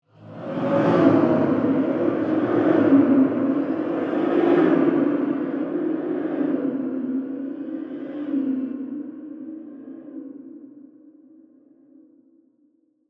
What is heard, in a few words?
Demon Voice Swirl; BlastWave